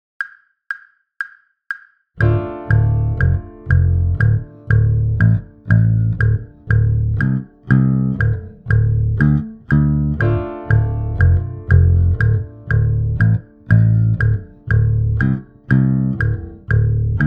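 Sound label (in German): Artikulationsübung in D Moll Tenuto Staccato, bass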